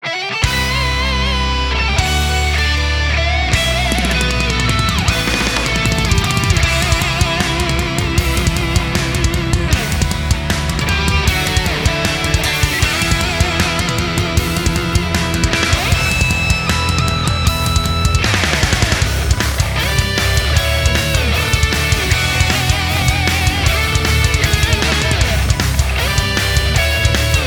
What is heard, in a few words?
Metal; Dialga